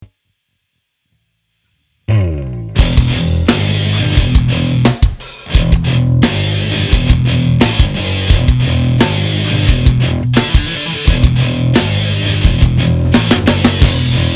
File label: Metal